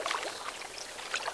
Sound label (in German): wasser; Tomb Raider PS1; lara